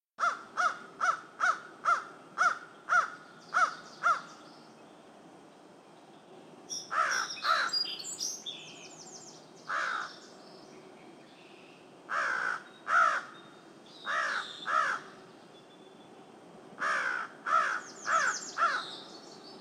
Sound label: crow; anemoi